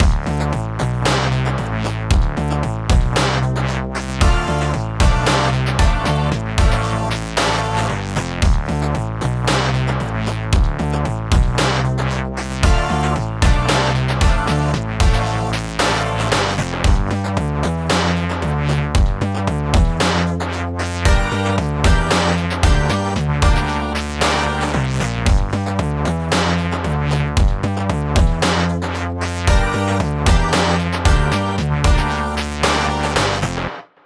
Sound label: Dubstep (Dotted); Rhythm Doctor